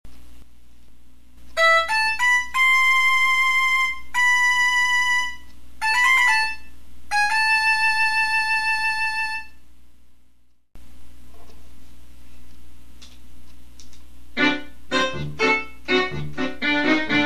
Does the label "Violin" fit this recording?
Yes